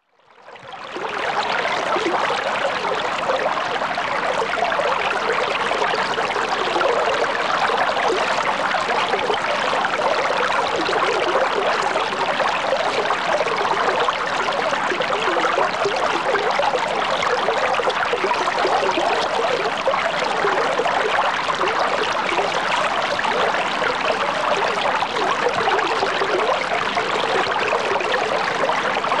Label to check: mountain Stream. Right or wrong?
Right